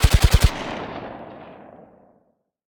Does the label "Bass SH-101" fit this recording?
No